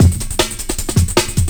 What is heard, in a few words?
break